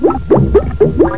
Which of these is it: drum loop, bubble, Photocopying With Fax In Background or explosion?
bubble